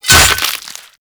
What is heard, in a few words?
Axe Impact Flesh; Warframe; Weapons; Impacts